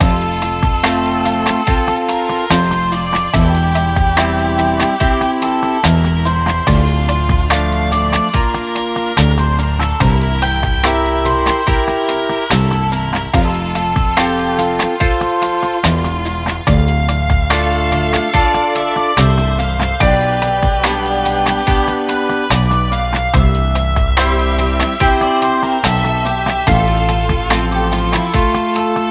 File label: oboe concerto 2nd; bach; bwv 1056